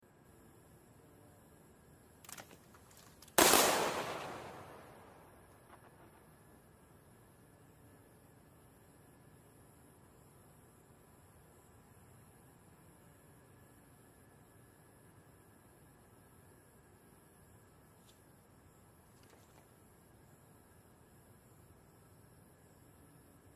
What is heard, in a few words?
silver taps 21 gun salute